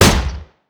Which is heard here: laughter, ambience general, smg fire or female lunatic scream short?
smg fire